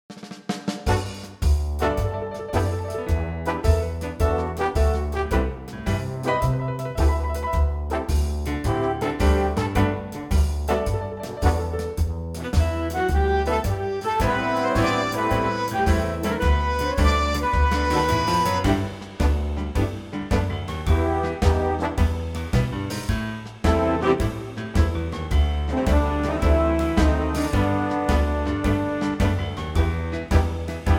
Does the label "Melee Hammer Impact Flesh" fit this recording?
No